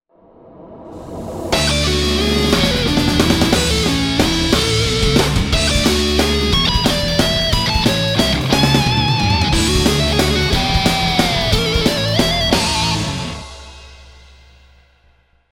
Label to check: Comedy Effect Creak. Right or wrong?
Wrong